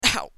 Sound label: Pain; Assemblee SFX